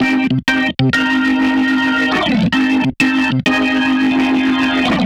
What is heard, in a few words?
Bass Organ, 95bpm, C2, analogue circuit; musicradar